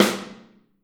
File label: Snare OH; Snare OH All; Drums Percussion